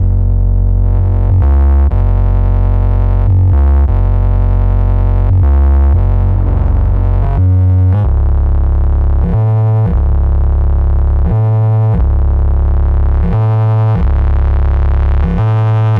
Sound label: Erode Syn Bass, Gbm, 120